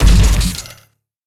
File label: Sci-Fi Weapons-Assault Rifle-Single Shot